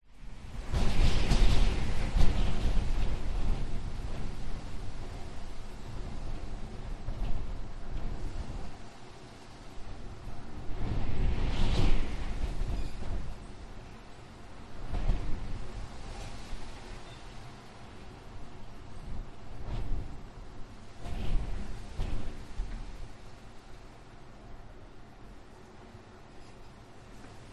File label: nature wind blustery outside open window palm trees narrow a jar air con; zapsplat